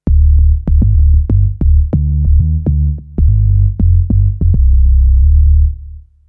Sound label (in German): basslauf